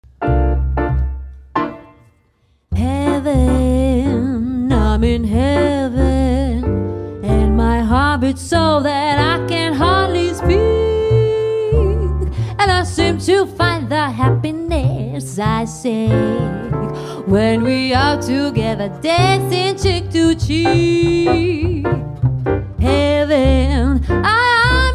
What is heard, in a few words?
jazz